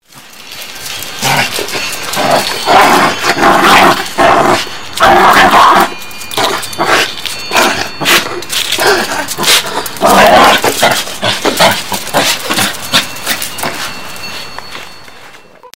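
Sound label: growls, dog, growling up a storm; Seymour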